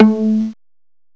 PIZZ LOW; M8; CMI Factory

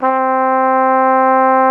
cornet C3; TUBA +CORNET; Greytsounds VOL-1

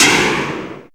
Perc FX, Percussion; Dance Synths

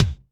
Kik Head Punch, Drum Machines Alesis D4

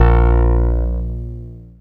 A#, PIANO; Big Fish Audio; Synclav FM

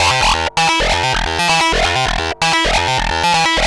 Analoop, Industrial

